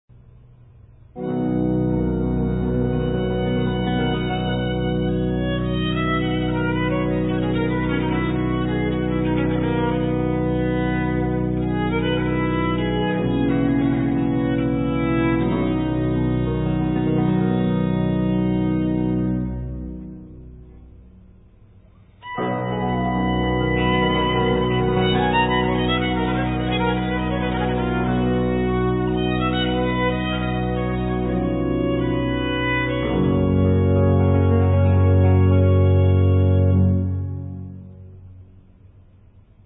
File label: Trio Sonata In G; Buxtehude; Sonates; Reinken